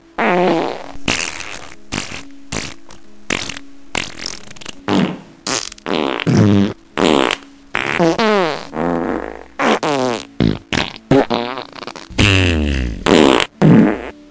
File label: farts